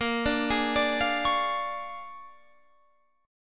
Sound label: A sharp 7th Sharp 9th A sharp down Guitar Open F; A#7#9; arpeggiated